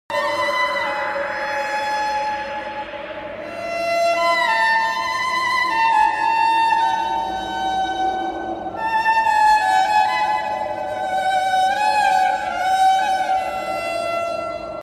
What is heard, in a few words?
violin tune; mohabbatein